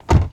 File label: Car Door Close